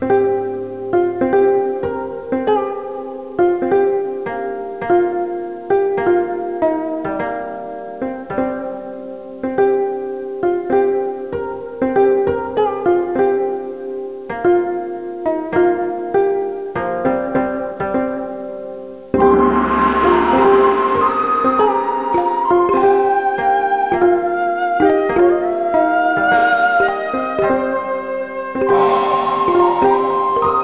Oriental Style; 1:55 min.; RCT

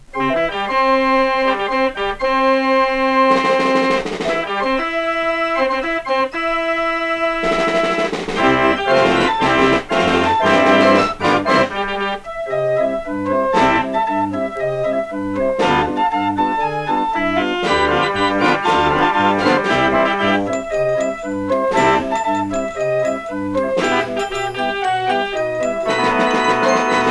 Bressingham Voigt Organ, March; 3:04 min.; RCT

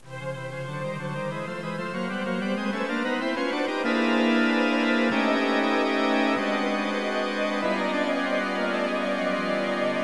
Horror Style; RCT

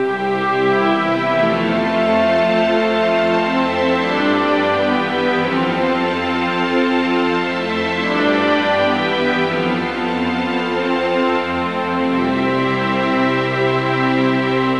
Jurassic Style; 2:13 min.; RCT

